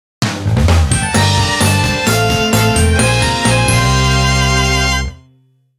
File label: Jingle, BGM; DEARLY STARS; BEST OF 765+876=!! BGM Collection; DS Jingle Ver.